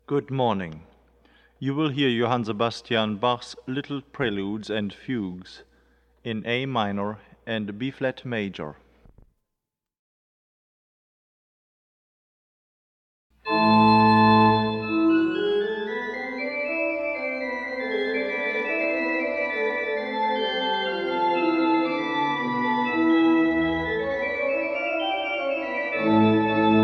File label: Little Prelude and Fugue in A Minor Prelude; Bach; BWV 559